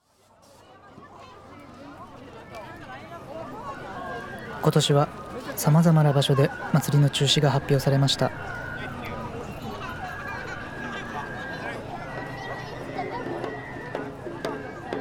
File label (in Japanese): 新庄の祭囃子ナレーション入り